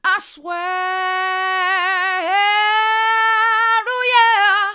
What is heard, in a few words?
i swear mid, vocal